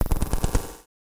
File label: SPACE FX 140; SpaceSounds